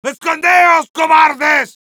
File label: Heavy battle cry, es; "¡Escondeos cobardes!"